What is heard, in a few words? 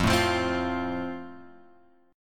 F Major, F Chord; F Chord {1 0 3 X 1 1} strummed